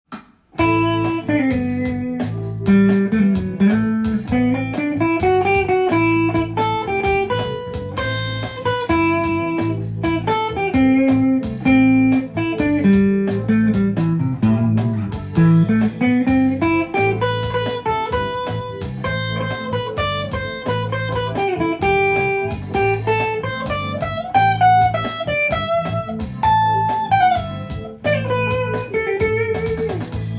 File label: a dorian solo, guitar